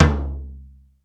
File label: BEAT KITS, T; Crypton - DrumBase5000